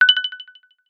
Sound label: app alert tone